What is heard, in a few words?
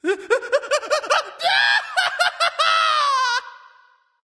fear; smite; loki